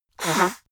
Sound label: nose blow dry short; soundpool